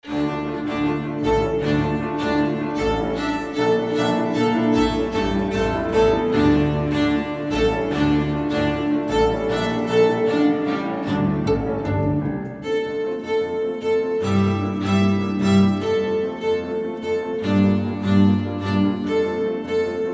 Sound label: Open String Rumba